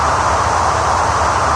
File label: hovercraft yaw; There; ThereClient